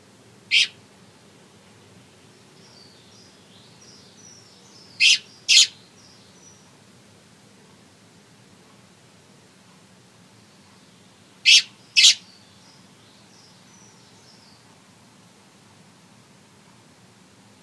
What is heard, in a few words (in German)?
junge amsel